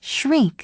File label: shriek